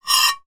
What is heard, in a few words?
household knife or other cutlery scrape on china plate